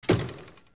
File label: Arrow Hit; An arrow hitting a surface; Hero's Bow